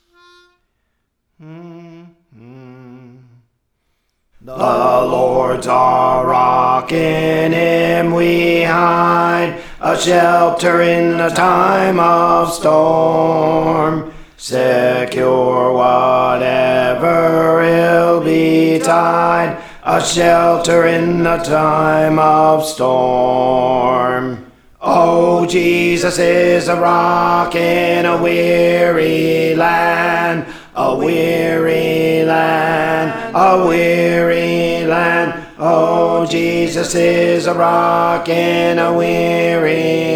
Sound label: Alto, Praise For The Lord Training